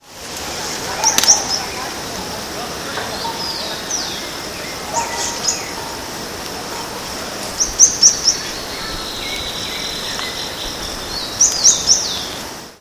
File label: Xenops rutilans; Pco Anta Mongagua SP